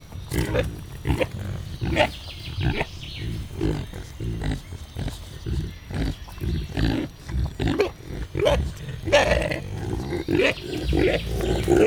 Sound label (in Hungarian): beszedes eves, orvos pekari; miskolci zoo 2018